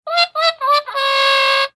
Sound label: embarrassed fail